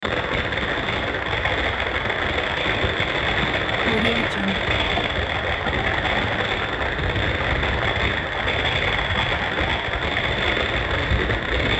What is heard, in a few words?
crunch rattling noise